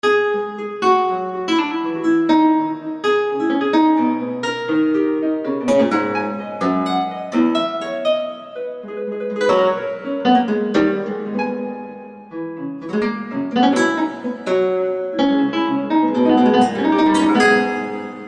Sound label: nylon fleta guitar full